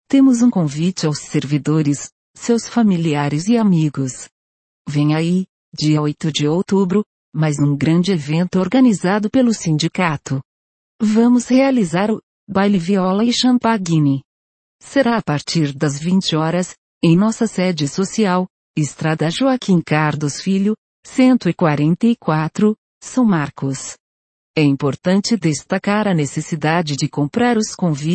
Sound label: Soar viola